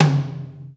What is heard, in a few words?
AMB T, HALL KITS; Crypton - DrumBase5000